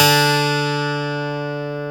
12 STR D3, PIANO; AKAI S-Series CD-ROM Sound Library VOL-8

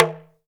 TALK DRM, Heart of Africa; Spectrasonics

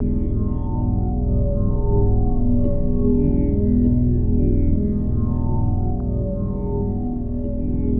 Guitar Textures

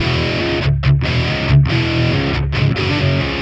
Distorted Guitar 140BPM C#min; Distorted Guitars; Vengeance - Essential Clubsounds Vol.4; Guitarloops

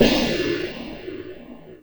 Crash, Drum Hits; Nu Dance Electro

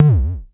Klone Dual Percussion Synthesiser tom

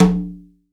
CONGA, STEREO CONGA

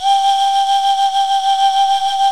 BOTTLES; OMI - Universe of Sounds

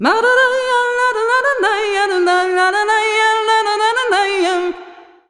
THA VOICE R; USB Soundscan vol.63; AKAI

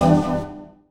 chords

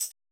shakker; Roland MC-909

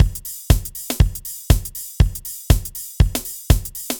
Synth Loop, BPM120

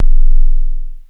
KLONE POP, Klone Dual-Percussion-Synthesiser; KLONE PERC NW8

